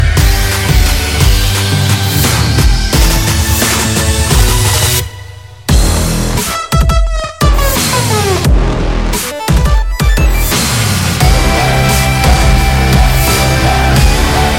Skydive Inst

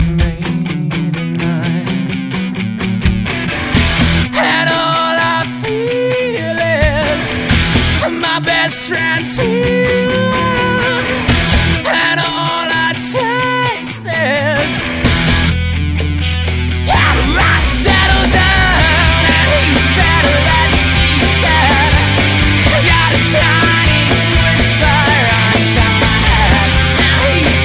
dubstep headrush

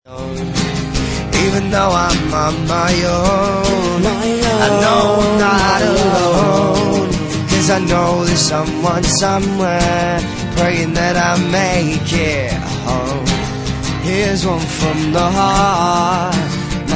acoustic

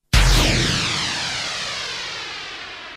Rocket Sound Tone

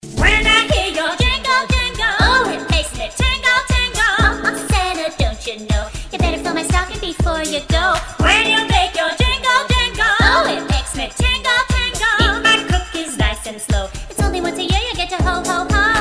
jingle jingle; ringtones

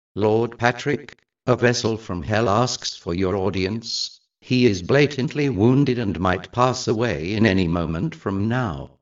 Is Calling Rap, ringtones

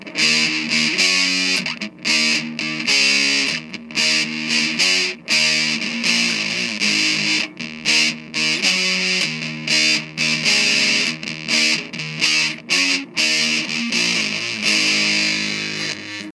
Riff

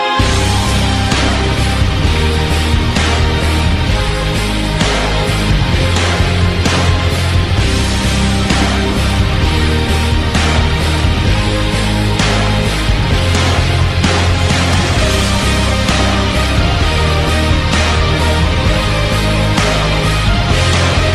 Rock motivation

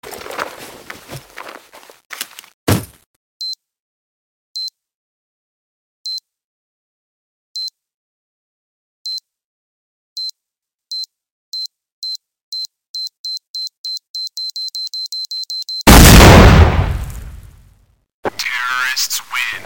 c4 bomb

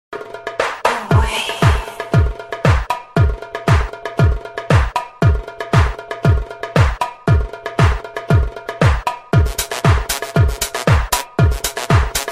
drumbeat tone; dance

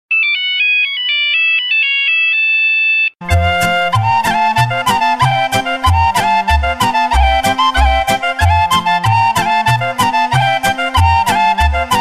Nokia tune

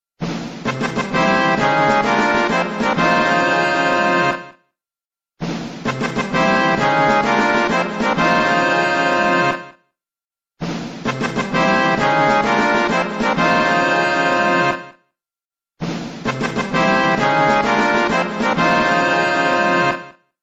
victory tone

